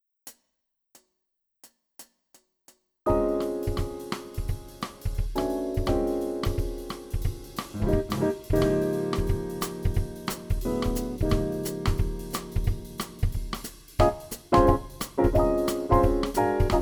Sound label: the girl minus bass